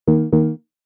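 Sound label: error answer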